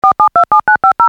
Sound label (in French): Compose n° automatique, Sonette